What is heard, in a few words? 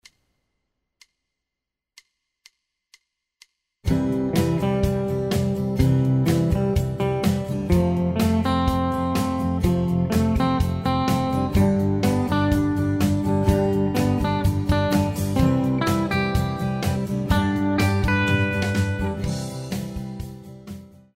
simple caged triads, guitar